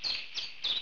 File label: bird; Deers Revenge